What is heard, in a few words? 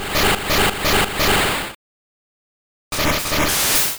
Razor Leaf; Pokemon; Gen 1; attack moves; RBY